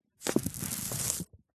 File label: foley paper sheets stuck together wet glue peel apart